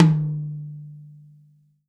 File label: TOM, Real Drum Kit